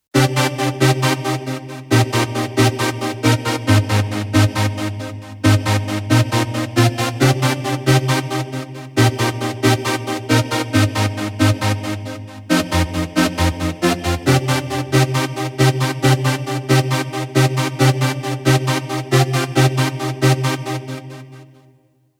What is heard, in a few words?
vr-09 poly slice